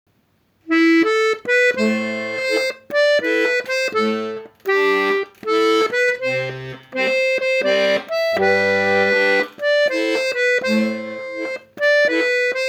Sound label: Mazurka; Ar Vuhez